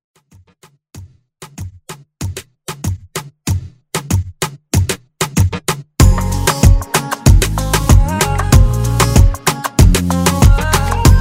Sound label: Moombah Redrum